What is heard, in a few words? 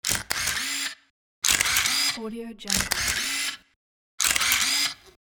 camera shutter